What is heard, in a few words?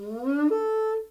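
African horn, Mozambique horn; Spectrasonics; Heart of Africa 1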